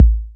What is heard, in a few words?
Hip Hop Drum Samples